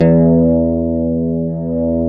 GTR CH STRT, Clean E.Gtr, Ultra Sheen; Roland L-CD701; Clean E.Gtr4

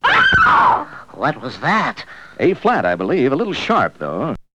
Vocal; ZERO G - Datafile DISC 1

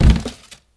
Plastic Dustbin, household rhythm samples Hits

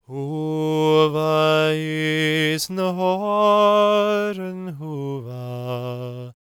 GAELIC, World Voices; ILIO; Vocal Planet

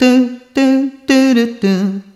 Rhythmic G

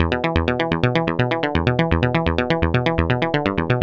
SEQ ARPEGG; AMG; Now CD-ROM (Roland)

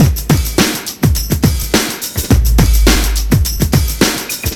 sweetbeat, 105bpm; Zero-G; Freak Beats

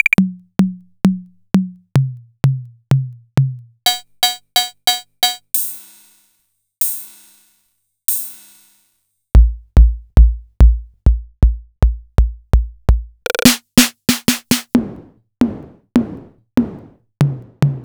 Korg KR-55B

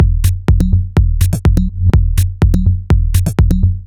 F# 124bpm, House Drumloops; Zero-G House Fabrik Drumloops

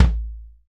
BD, BD REAL

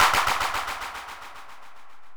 Clapfx; Sonic Foundry (Sony Creative Software); World Pop; Percussion

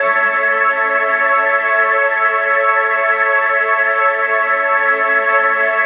Dream Pad (Maj)

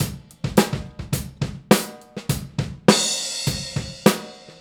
Straight Kit, World Pop Beats

